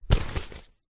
game open leather bag mechanical gear mechanism accent; ancient game